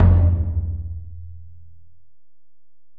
Tom, Alesis DM-PRO

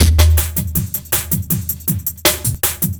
Downtempo Echo, Drum N Bass; Sonic Foundry (Sony Creative Software); Knutrix Drum & Bass

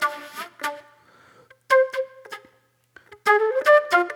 Flute, Disco

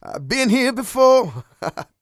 SING, Vocal Planet R&B & Dance; ILIO

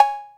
808 COW; Back In Time Records; Big Bang; THE HIP

